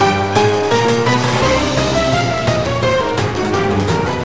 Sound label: BGM ghost closer Intro; bail or jail; obakeidoro; windows